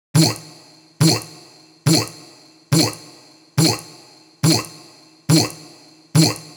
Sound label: Vocal Chant Loop 140; Vocal Loops; Ghosthack Advent Calendar 2018 Day 9